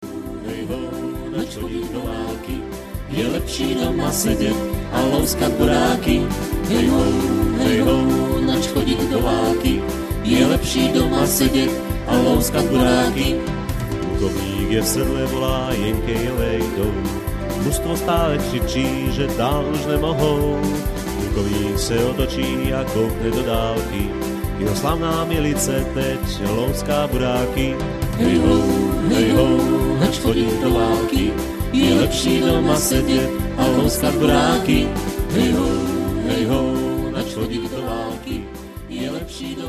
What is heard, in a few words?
Country